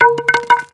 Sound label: Baseball; Sfx Baseball